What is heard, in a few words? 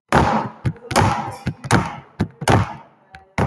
gun shots; memes